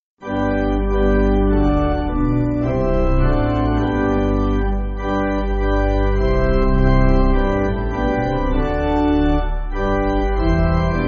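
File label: Pipe, Pipe Organ, Music Backing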